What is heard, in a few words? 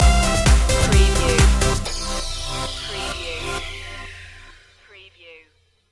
fresh and funky stinger